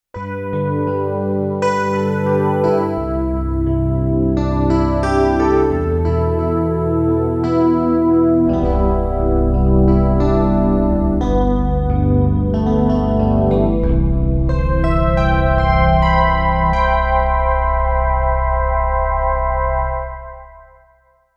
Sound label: Vox Picker